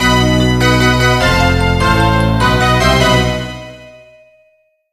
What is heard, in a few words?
Level Completed; SpongeBob SquarePants Creature from the Krusty Krab DS